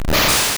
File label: Fly Hit; Pokemon; Gen 1; attack moves; RBY